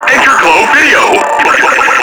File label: Proto Infested Ancient Tentacle Swing; Warframe; Abilities